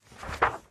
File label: open flip; UI; Minecraft; neoLegacy